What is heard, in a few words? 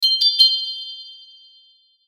Musical Twinkle; Musical Twinkle High Vibrato; Miscellaneous Musical; Blastwave